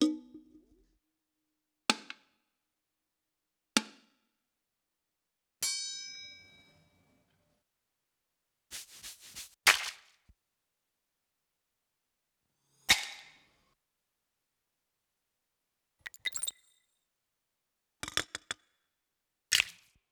Percussions

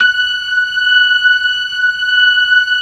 LO MAN V L, LOMANUAL VIB; InVision Interactive; Keith Emerson

